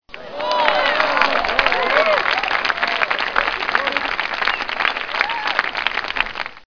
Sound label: Applause